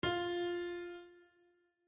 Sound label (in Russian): pianino FA